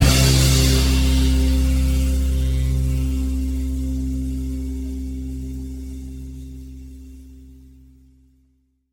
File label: strum, Stabs